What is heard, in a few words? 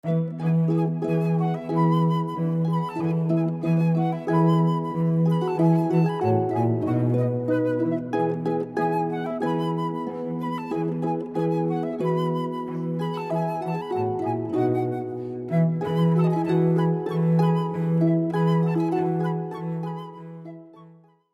Spring, Four Seasons, Vivaldi, harp flute cello